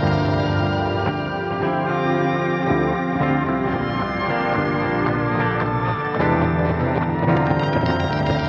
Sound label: Loop